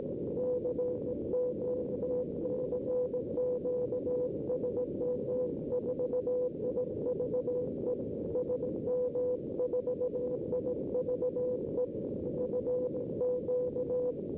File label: CW; SM4IVE